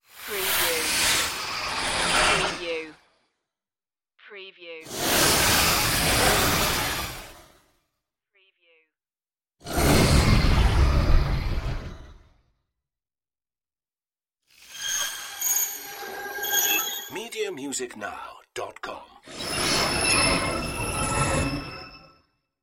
sliding space swooshes

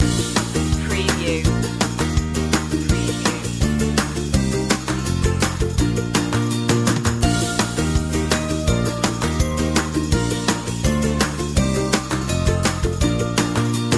kids in the sun loop

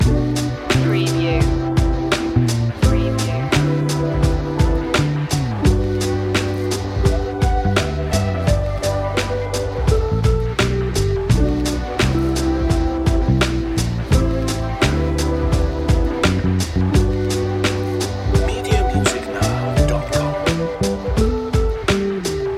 sunny vibe loop

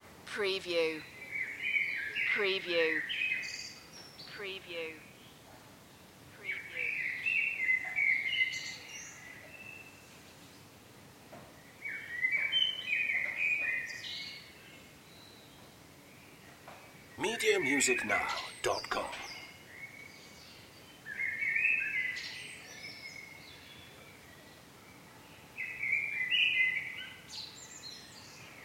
backyard morning birds

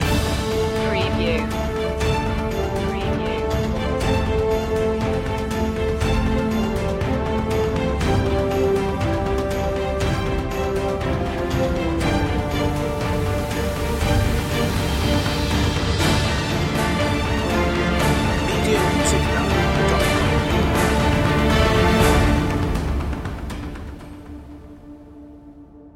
orchestral mix

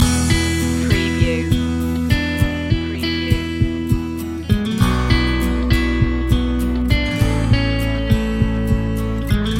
positive flow loop

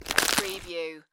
Snow and Ice, Ice cracking

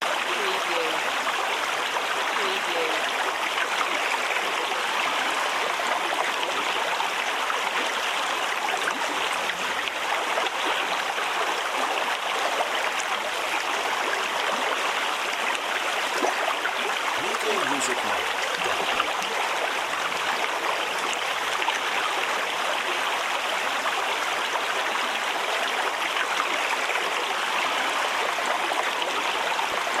Brook High

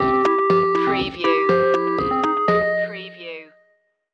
8-bit boss stinger

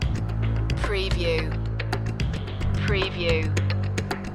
epic intro loop

